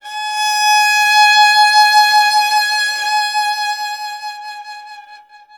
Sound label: Violin; Sonic Foundry (Sony Creative Software); World Pop; Stringed Instruments